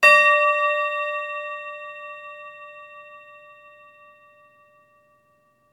gong solo

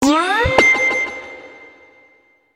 charge

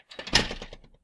door open

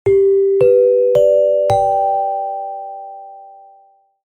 callbell

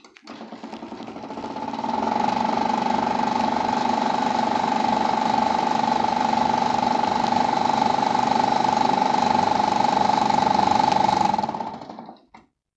auto curtain

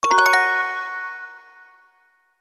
receipt

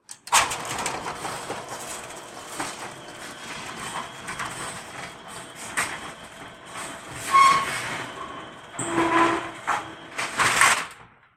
auto shutter

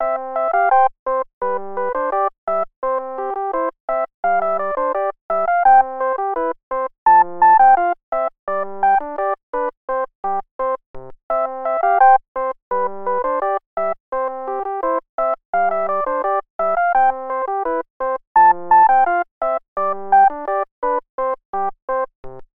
hold music

